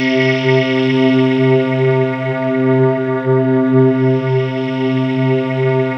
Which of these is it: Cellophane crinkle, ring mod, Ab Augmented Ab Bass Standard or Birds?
ring mod